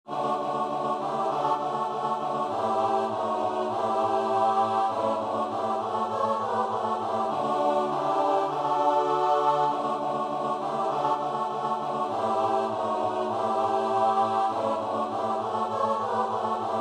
choir

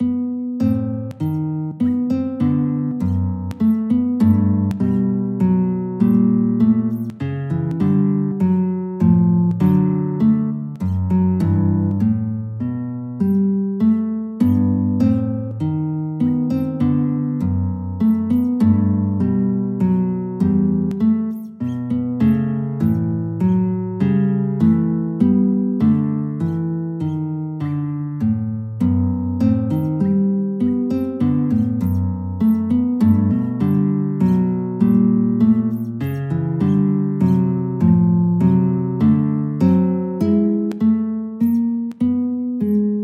guitar

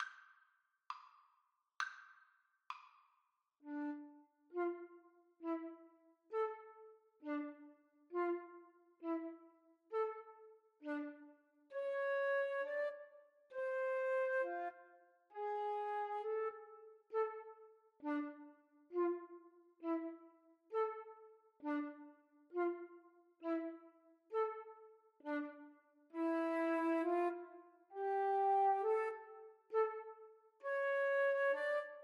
Flute duet

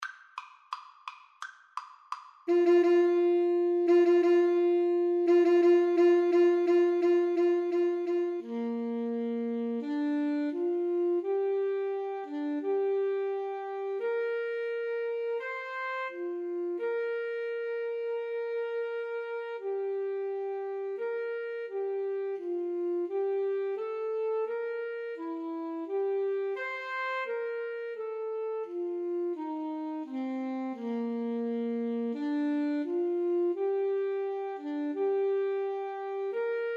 anchors aweigh 2 A SAX

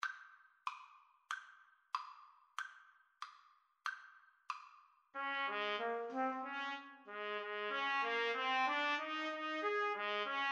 2 trumpet

